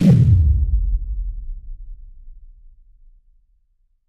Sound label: synth effects boom, sci-fi booms